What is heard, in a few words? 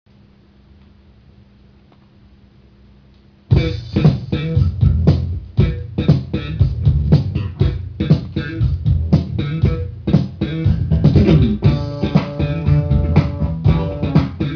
Rare Boogie Funk